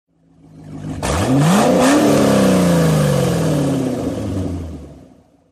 Engine Msg Ringtone

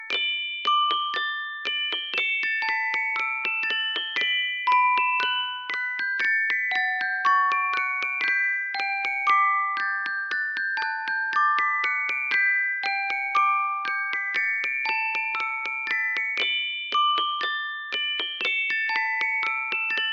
Canon Music Box

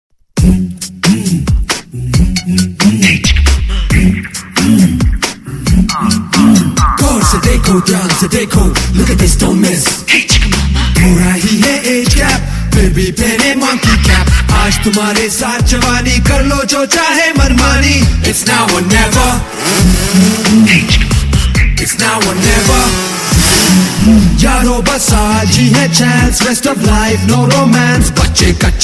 Ringtone

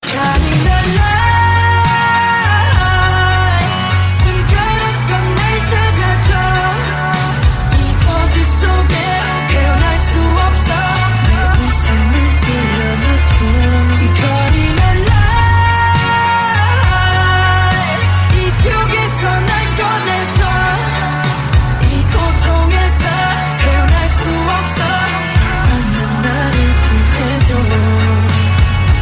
chorus

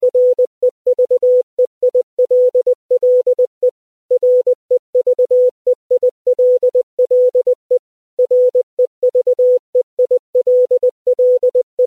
Reveille

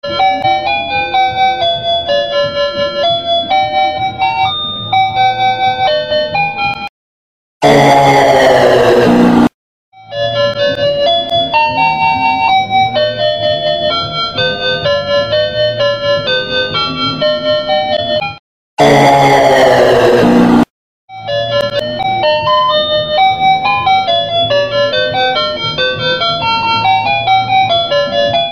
Wake Up Alarm

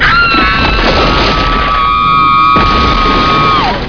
Scream; ruby Rod

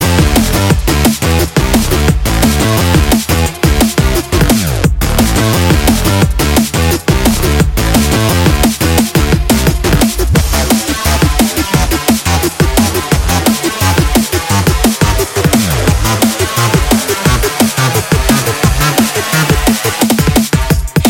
Sleep-walking Tune

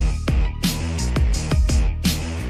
MIC DROP

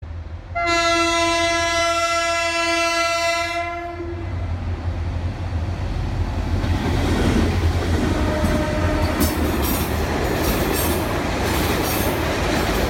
Alstom PRIMA horn

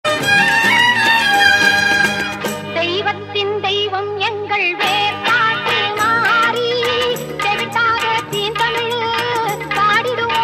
Tamil Devotional

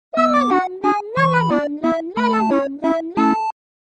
Nokia Tone

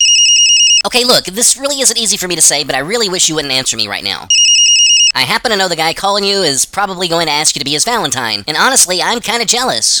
Jealous Phone

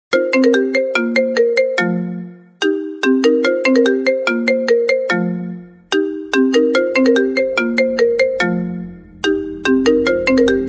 iOS ringtone Remix

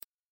High Freq Tone

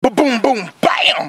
Ba Boom Boom Bam

